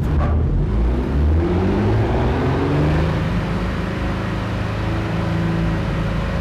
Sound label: rev, vehicles cars; tdmcars; wrangler